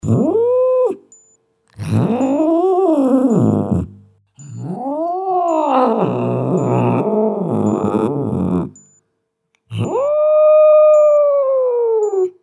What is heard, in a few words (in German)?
katze